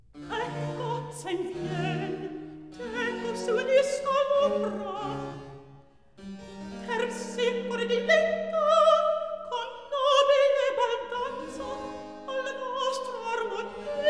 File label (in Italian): Recitativo; Ecco sen vien; Terpsicore HWV 8b